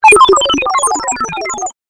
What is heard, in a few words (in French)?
clavier; MOBICLIC157